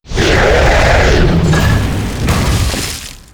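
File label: Boss death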